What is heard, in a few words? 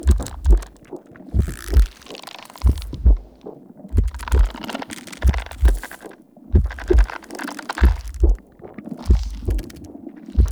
Infested Pustule Beat Large Loop; Ambience; Props; Warframe; Infested Eris